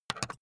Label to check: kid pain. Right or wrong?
Wrong